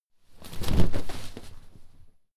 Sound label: clothes hit drop furnitures